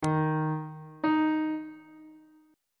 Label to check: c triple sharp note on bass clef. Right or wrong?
Right